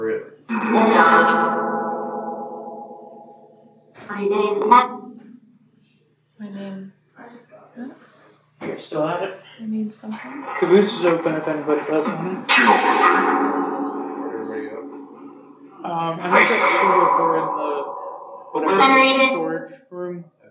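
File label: Train Station Phasma Box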